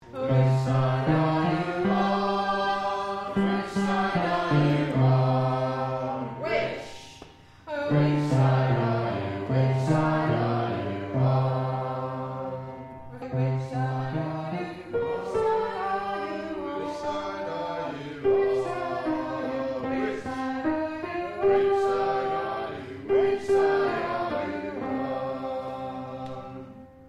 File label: bass chorus